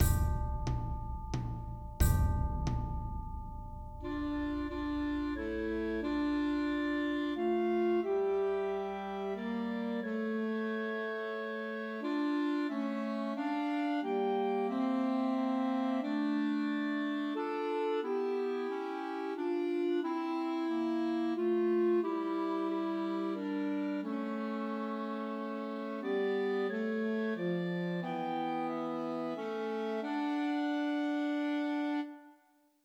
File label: Christmas Trios for Two Clarinets and Alto Saxophone; Coventry Carol